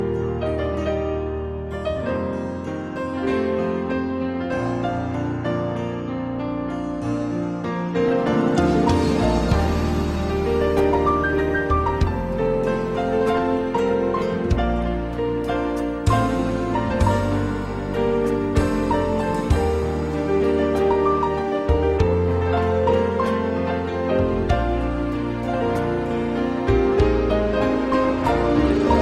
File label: Instrumental Endless